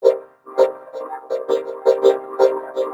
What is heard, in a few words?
MECHANIXXX, Synth FX